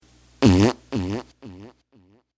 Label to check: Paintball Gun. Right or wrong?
Wrong